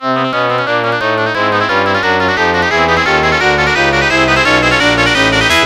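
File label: background music; Bionic Commando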